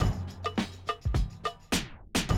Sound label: Classic DrumLoops; Best Service; XXL 1500 Classic DrumLoops; Sample CDs